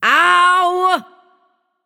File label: ouch; Smite; bellona